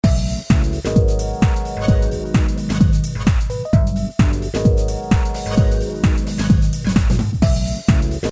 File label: Roland MC909 garage